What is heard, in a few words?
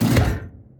gun turret deactivate; fight